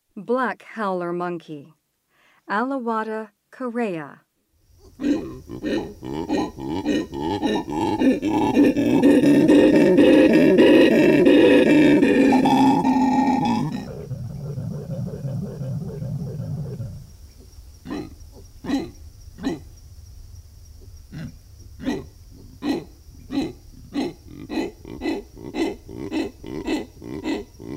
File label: Black howler monkey; S. America; Rainforest